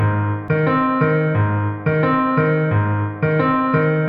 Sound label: Habanera piano; le tango